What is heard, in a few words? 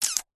human kiss single; zapsplat